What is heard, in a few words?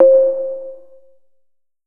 Bell Small Bell; Nexus6